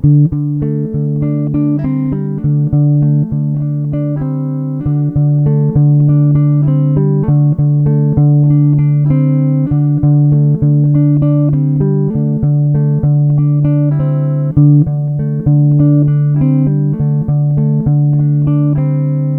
Guitar Loop; Wiseman

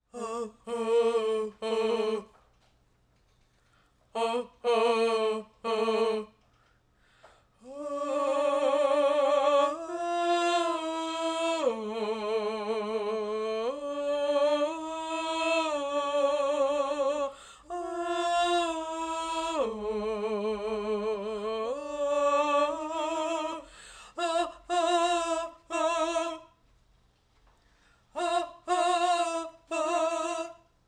Orc March Choir

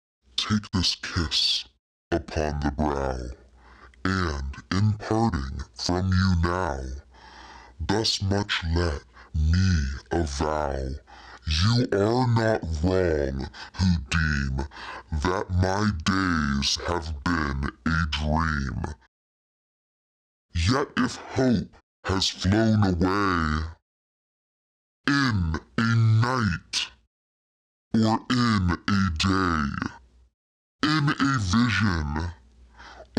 Vocals

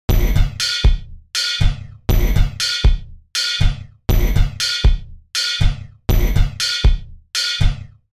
Technorganic kit 3

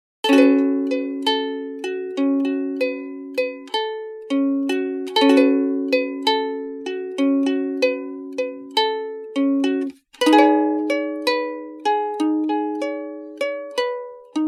ukulele starter 96

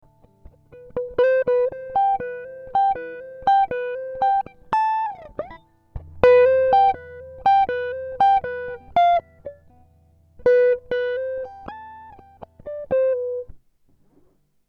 Am60 GTR impro

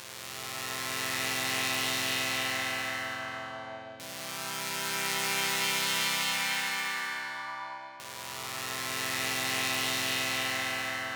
Electronic

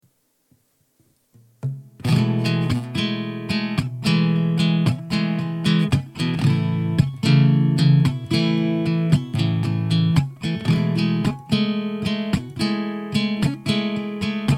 E112 Xtra Strummy GTR

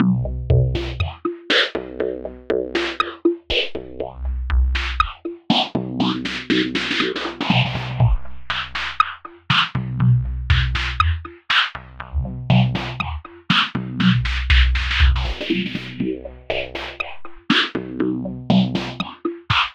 120bpm beat fx

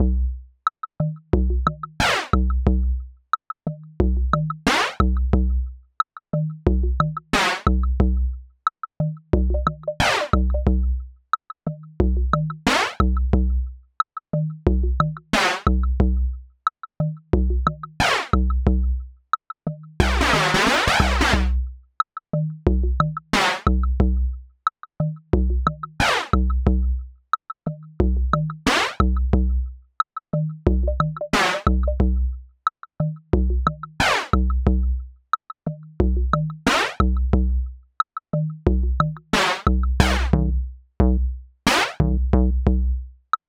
90 Drum Groove

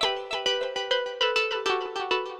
synth Liquid Keys 100bpm G

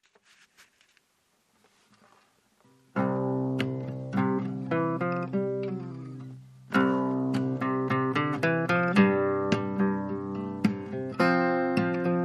Urban Groove

